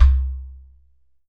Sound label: GHATAM